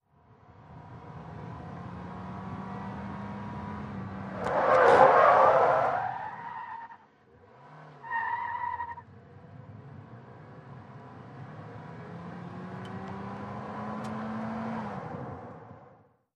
Sm Car 180 Rev Skid, compact

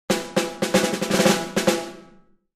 Drums Snare March

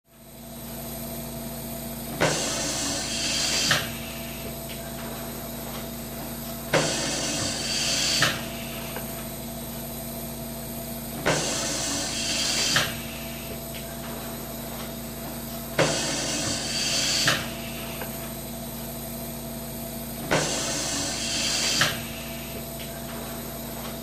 Noisy Respirator Pum

